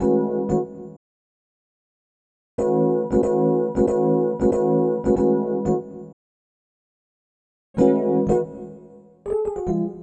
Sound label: Keyboard